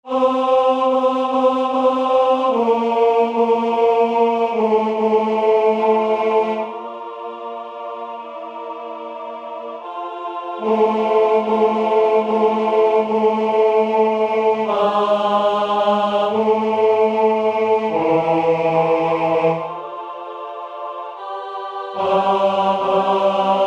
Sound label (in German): Bass1; Jauchzet